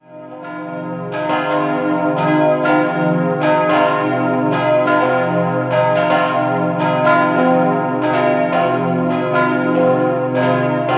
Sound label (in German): Rohrbach/Ilm, Pfarrkirche Verklärung Christi, glocken; Die Glocken wurden von Karl Czudnochowsky in Erding gegossen: Im Jahr 1953 die kleine, die beiden großen 1961.